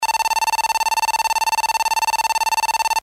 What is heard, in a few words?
Signal